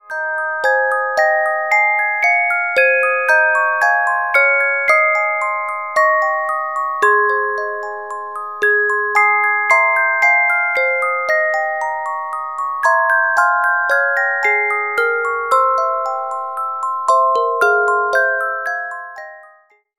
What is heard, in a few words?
Carillon Music Box Melodies